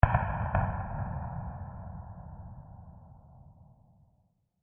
Two Small Explosions

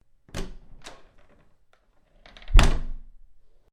Open Close Wood Door; Open Close Wood Door Szenario; Door Closing Sound Effects; Door Closing (Sound Effects:Doors)

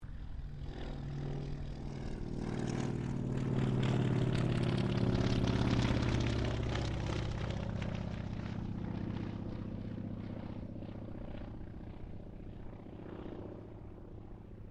AIRCRAFT CAC WIRRAWAY PASS

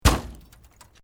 Metal Light Movement Minor

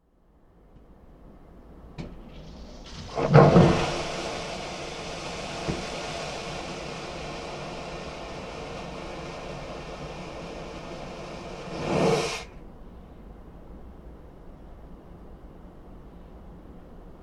Aircraft toilet flush; Airplanes; Vehicles

